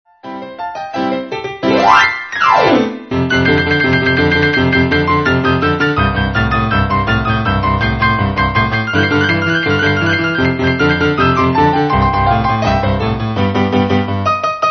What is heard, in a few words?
piano